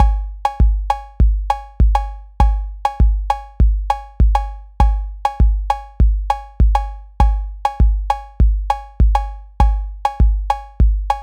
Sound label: brazilian 3 2 clave